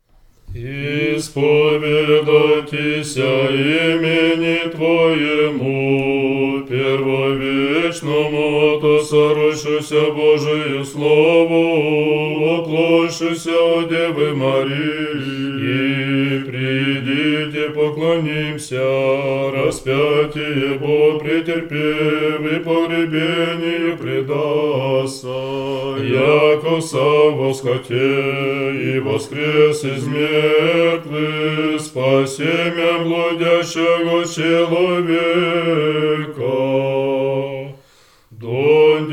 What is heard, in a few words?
resurectional tone 2; sunday